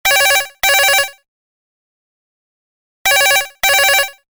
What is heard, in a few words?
household telephone digital ring; Electronics; Telephones; McKinneySound